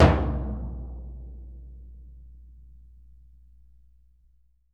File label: TMP C#, Synclavier Percussion